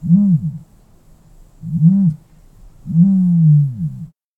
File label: ostrich